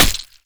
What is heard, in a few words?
flesh, bullet impacts